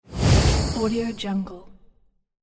trailer whoosh; transitions and movement

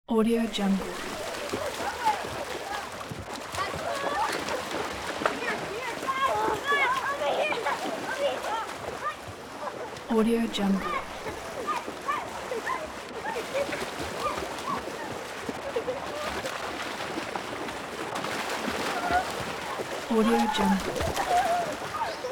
swimming pool ambience with children; آمبیانس صدای استخر; human sounds

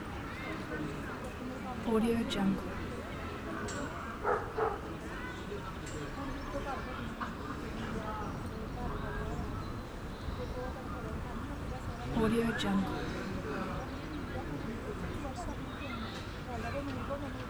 town park ambience portugal; urban sounds

